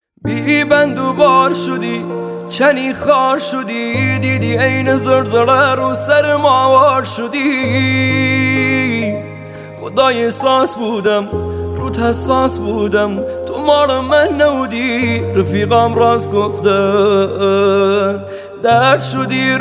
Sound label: Piano Version